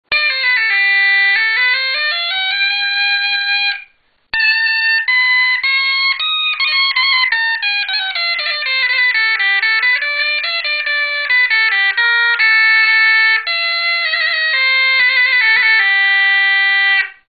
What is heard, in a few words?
salmaj alto g, bagpipes